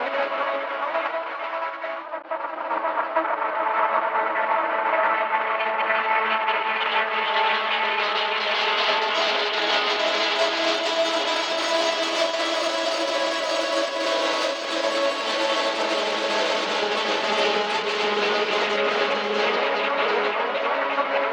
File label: Synth Grains; Granular Synth Loops; MindOverMatter; Hydrosphere